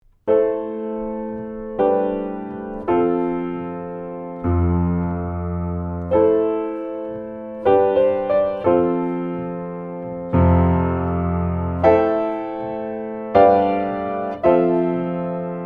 Piano

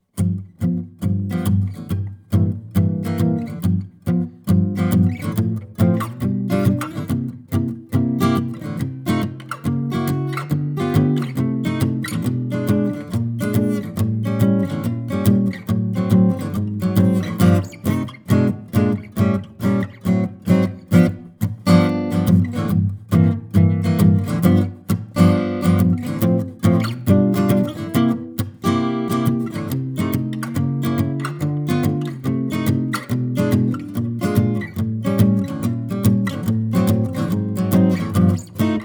Jazz; AMI KM100f

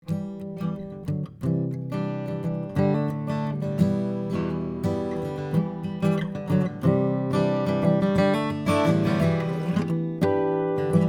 Harmonics

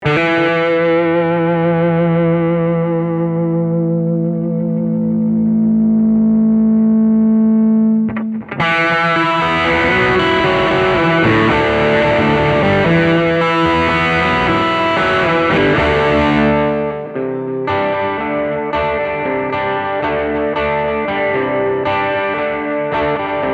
Leaving, Gibson ES225; I'm Leaving Now / (Original, in E); electric

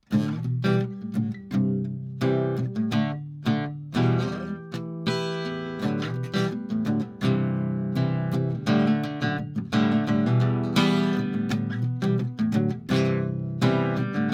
Harmonic; 1933 Gibson L10 archtop; Sennheiser MD421; Warm Audio WA12 preamp; Metric Halo ULN8 interface; Cardioid Pattern; Sennheiser MD421U5; TakesHigh SPL

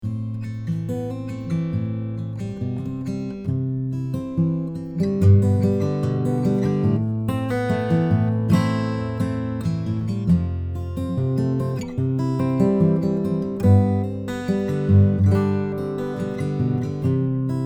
Fingerpkn, Neumann KM84

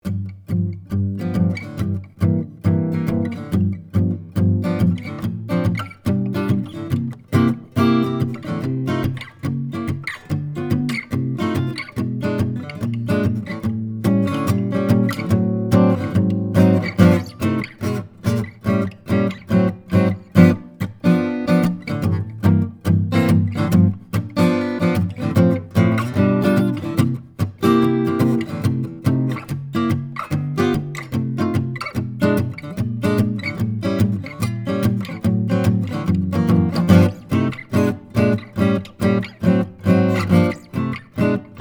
Jazz; AMI; Schoeps CMT541

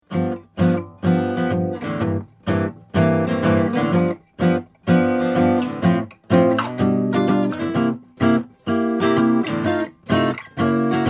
Jazz; MBHO

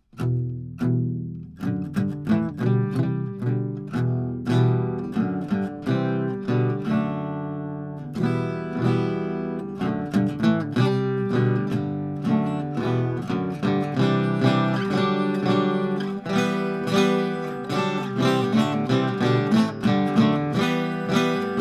A Range Chord Improv, Neumann U87Ai

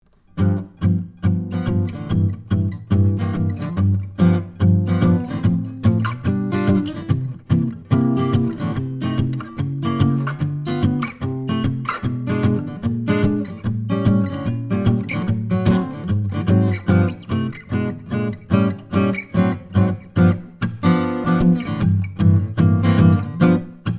Jazz; Rode NT1-A Microphone; V78M preamp; Sony PCM DI flash recorder; SANTA CRUZ OM/PW GUITAR

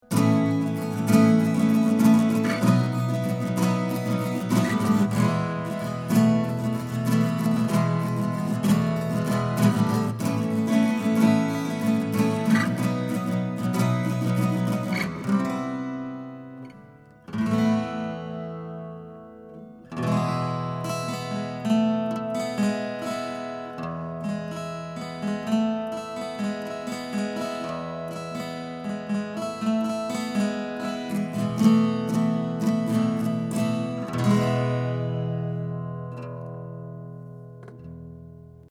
Guitar; schoeps cm61

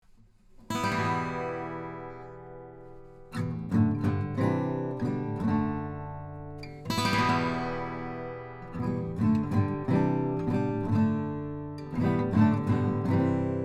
Chords

